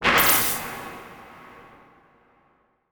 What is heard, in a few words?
Transition Blast Short Hit; Production Element Title Transition